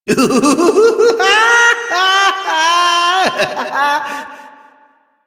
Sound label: Laughter; Warframe; Entrati Doppelganger; Evil Twin